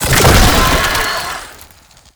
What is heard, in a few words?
Infested Roll Start; Oni